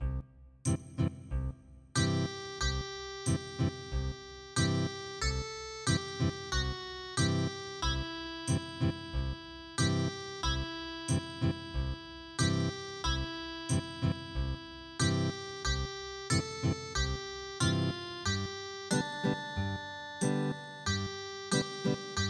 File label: Ethnic Guitar; There is a Tavern in the Town